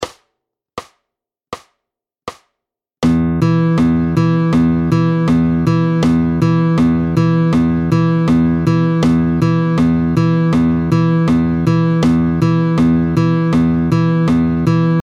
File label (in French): Bourdon, Un bourdon en mi, tempo 80